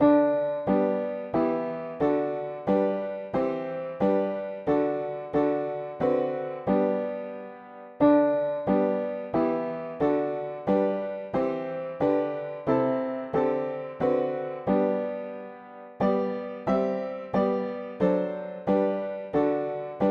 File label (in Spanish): mono piano; hymns; Himnario pequeno